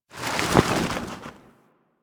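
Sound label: Settlement Cloth random ambience; Warframe; Grineer Settlement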